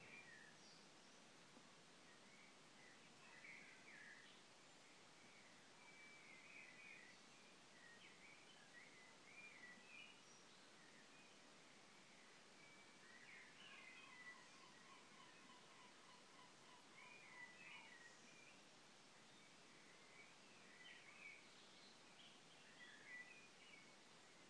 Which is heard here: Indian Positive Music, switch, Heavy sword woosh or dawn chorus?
dawn chorus